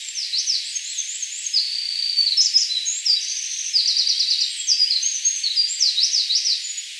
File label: Troglodytes troglodytes